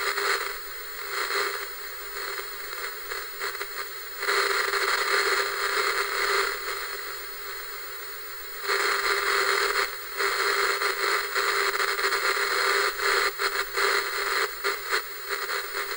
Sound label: Analog TV Noise Fragile Harmonic; The Soundcatcher